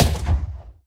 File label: vya23 last shot left, guns; War Thunder